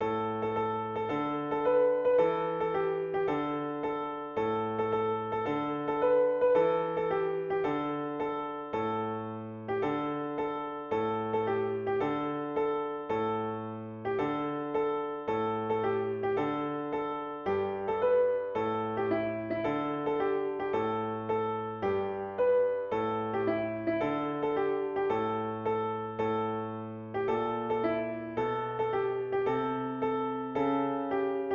花いちもんめ（ピアノ）

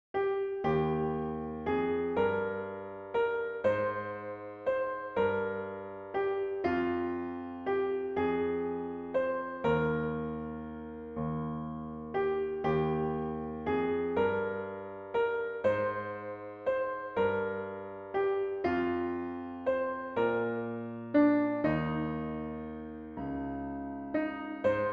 仰げば尊し（ピアノ）

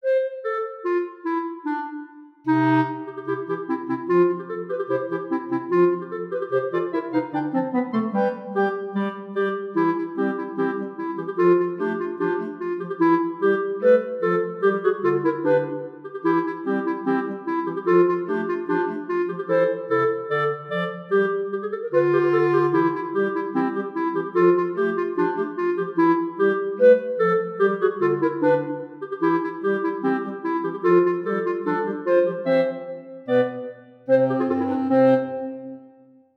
おもちゃの兵隊の行進（クラリネット）

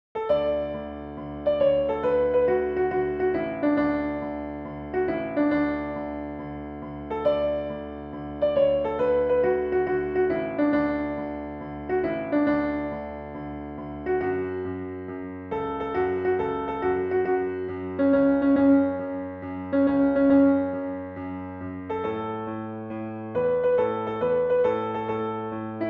フニクリ・フニクラ（ピアノ）

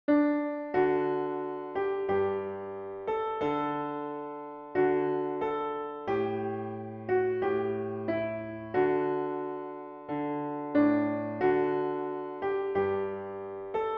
埴生の宿（ピアノ）

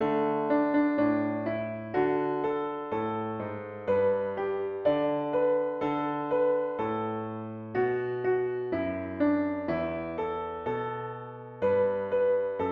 しゃぼん玉（ピアノ）

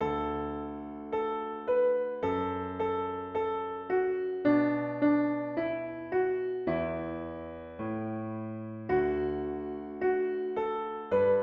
夕焼け小焼け（ピアノ）

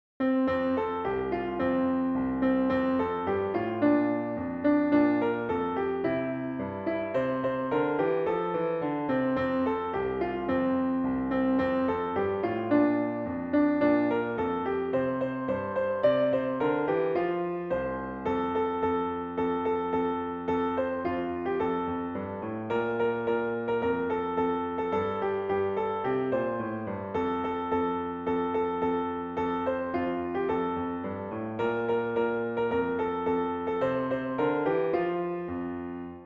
ジングル・ベル（ピアノ）

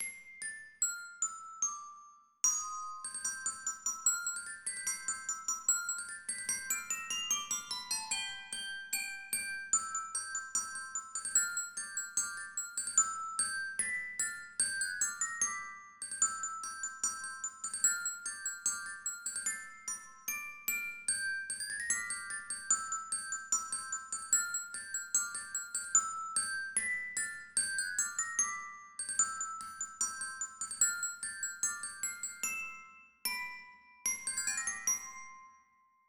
おもちゃの兵隊の行進（グロッケンシュピールver.）

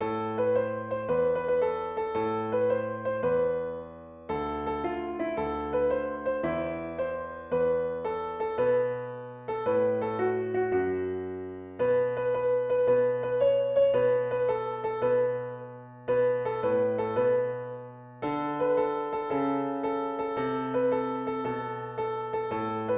ずいずいずっころばし（ピアノ）

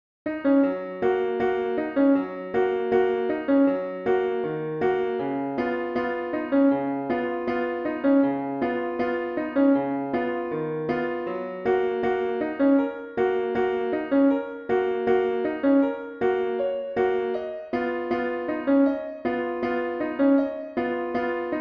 ねこふんじゃった（ピアノ）

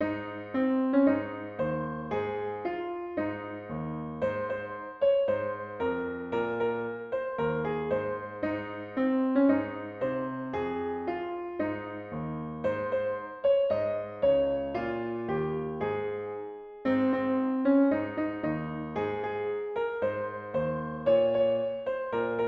おお牧場はみどり（ピアノ）

